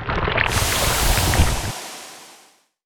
magma; weapons; dota; snapfire

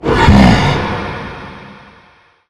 inner beast cast; weapons; dota; beastmaster

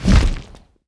flap, movement; dragon knight

